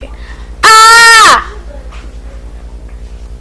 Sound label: aaaah; Netstory